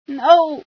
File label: pain, female sci